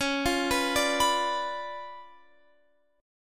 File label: C sharp 7th Flat 9th, Guitar, Open F; arpeggiated